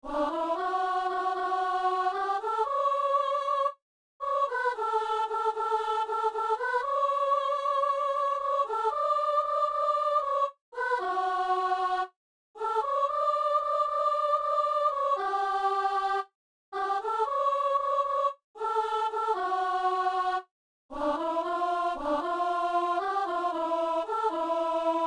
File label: soprano; Messe du 17 janvier à Saint-Denis